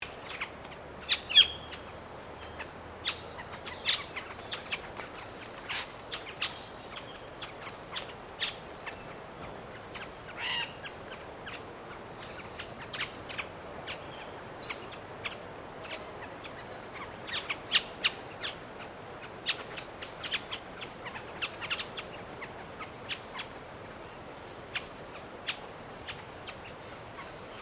Ambience Noosa Coastal Birds Ocean Loop, Sunshine coastal area; Outdoors